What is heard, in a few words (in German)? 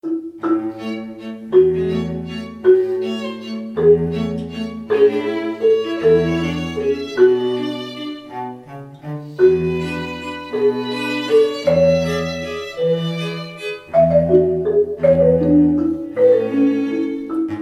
Klingelton Marimba; Android